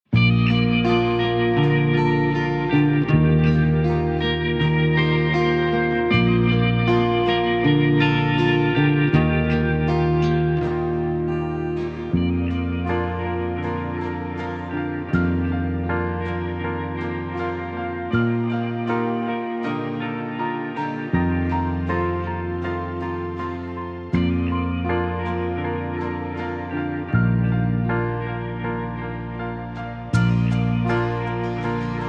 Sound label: Unplugged Karaoke, 80 BPM; BasserMusic